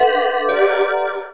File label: sms receipt